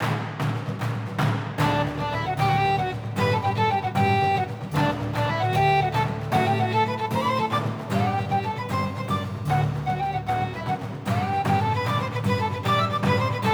Tavern fast; KOL Tavern

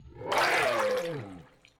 Death; Z Bolotnik

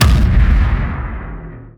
Cinematic Hit; soundbits

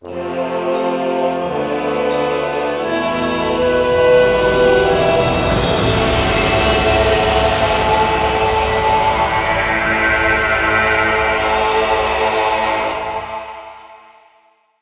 Bell rising; Indiana Jones and the Infernal Machine

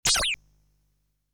Sounds that one barrier can be taken; legend of hero tonma

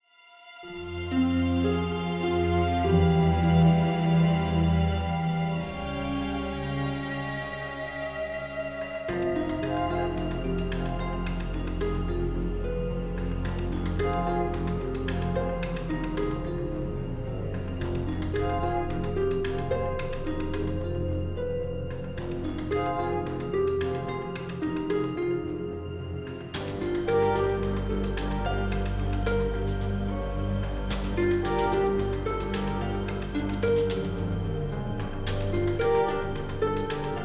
Ambient Mysterious; rwby grimm eclipse

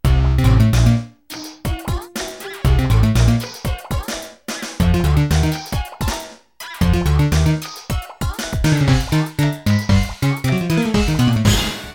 Musical Notes; Throwback Galaxy; Super Mario Galaxy 2; Wii

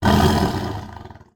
growl

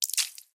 Blood Splut

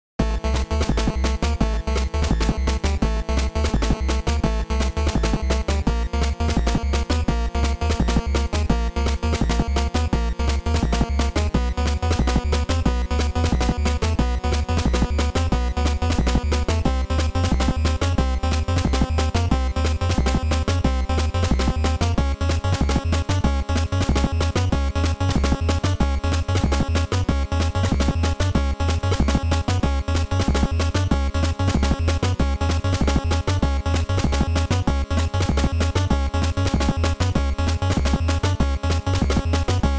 Low Timer; the cowabunga collection; Water Front

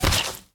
Player Hurt Shot

